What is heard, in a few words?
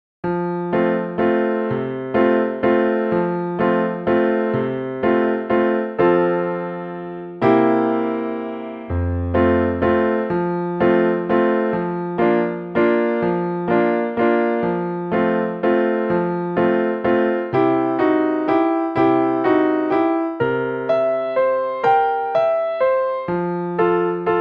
wiegenlied high accomp.